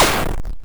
exp short hard, Explosions

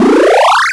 sounds powerup

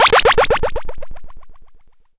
movement portal

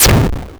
exp shortest hard, Explosions

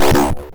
interaction

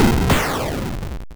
explosion double

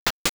movement footsteps

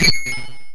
sounds negative

